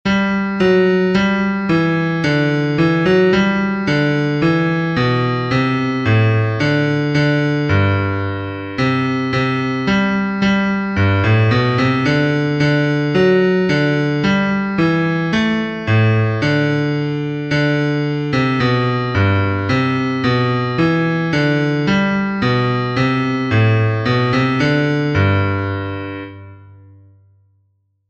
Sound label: Bass